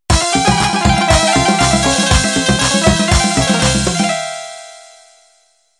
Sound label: Cifteteli Intro